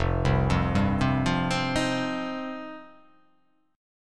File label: F 7th Suspended 2nd